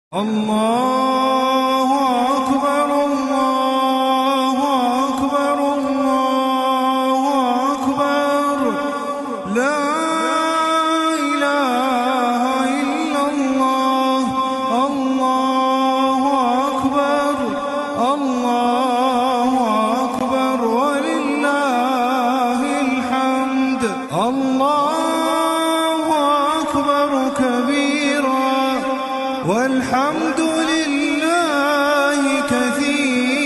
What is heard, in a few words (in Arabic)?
تكبيرات العيد بصوت جميل جداً